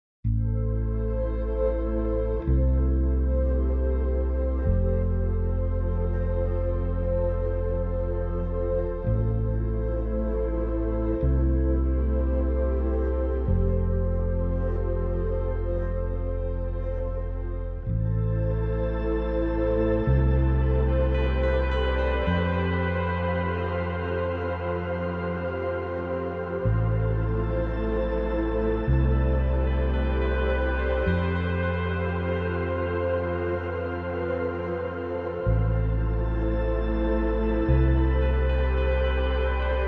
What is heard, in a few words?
No Pianos